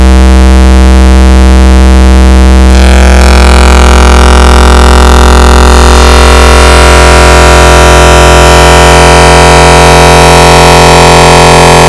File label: Harmonic Square, FM Wavetables; Cymatics; FM Wavetables Vol 2; Serum